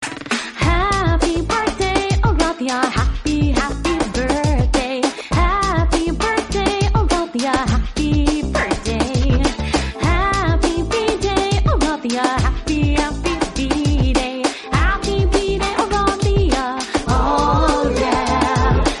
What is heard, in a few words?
Happy birthday Ringtone